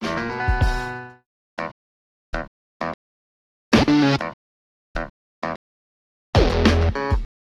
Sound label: keys, phonky loops